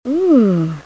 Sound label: Huuuuuuuuuum